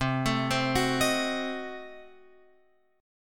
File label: C Diminished 7th C, Guitar Open F; arpeggiated; JGuitar